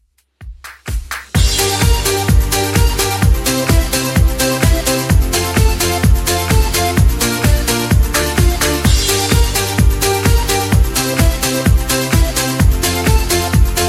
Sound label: 80s Redrum 128 bpm